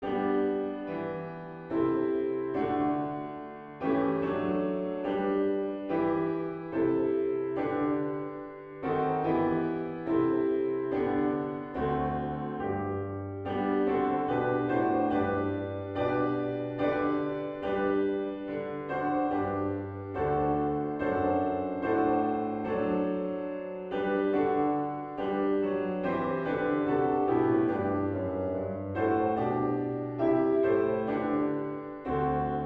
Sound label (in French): hymne national anglais